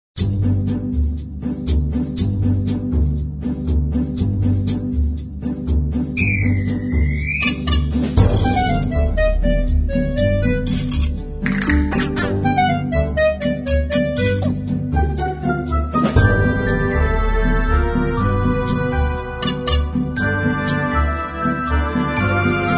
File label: no sleigh bells; Toy Factory; 音乐素材 圣诞玩具厂 Christmas Toy Factory